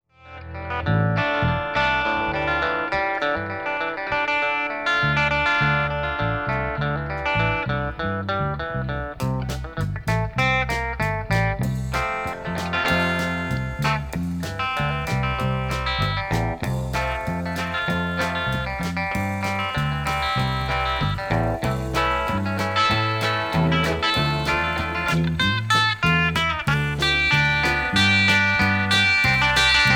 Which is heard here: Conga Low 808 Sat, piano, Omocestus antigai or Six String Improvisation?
Six String Improvisation